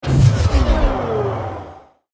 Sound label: slowmotion fadeout; nanosuit